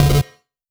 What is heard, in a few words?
Quick Bass, 8-Bit; 8-Bit Kit Volume1